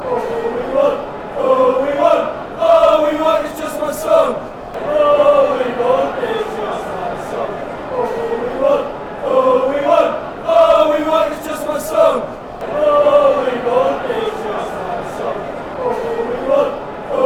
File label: all we want one song, fan chants